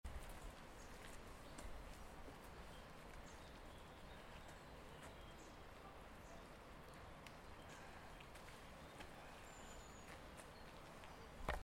AMBIANCE SILENCE NATURE; college vallee du thore

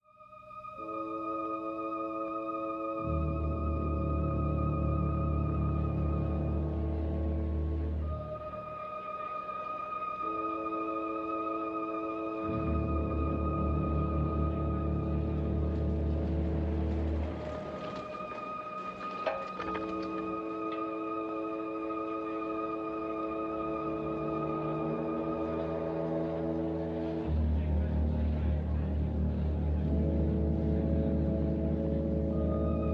ouverture soucoupe et descente; soucoupe et descente de Klaatu

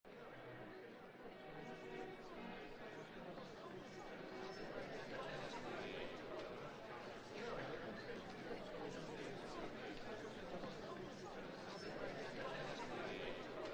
Ouverture convives

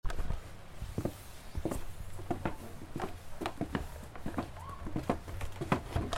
ambiance pas; college vallee du thore

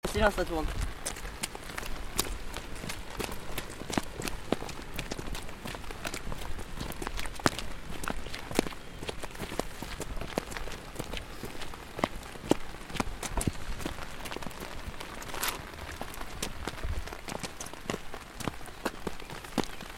AMB PAS ET PLUIE; collège Vallée du Thoré

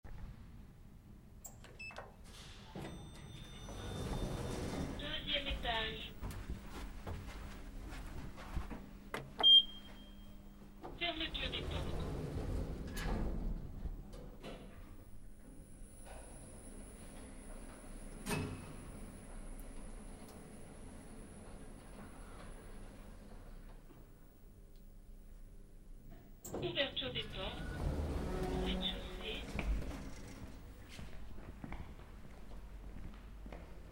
BRUIT ASCENSEUR 2EME ETAGE

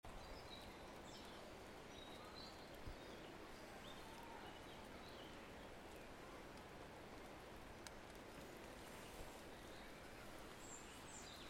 AMBIANCE NATURE OISEAUX; college vallee du thore

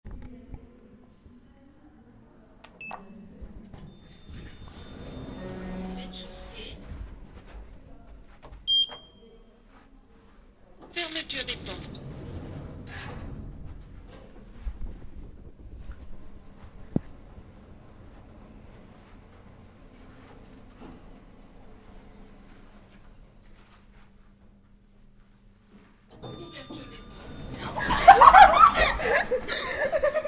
BRUIT ASCENSEUR 2EME ETAGE

